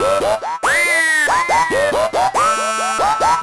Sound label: TECHNO SYNTH 140; Keys